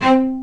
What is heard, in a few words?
Spicato Basses & Celli